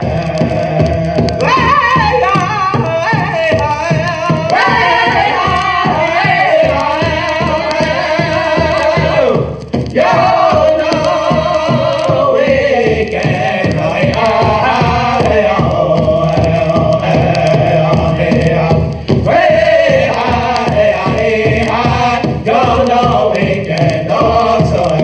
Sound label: Unknown Native American Chant; Bioshock Infinite